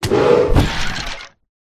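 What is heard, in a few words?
Dragon Skeleton die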